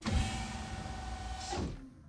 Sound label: door close; cardassian